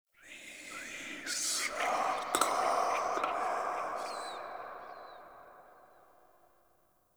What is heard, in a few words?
Room Whisper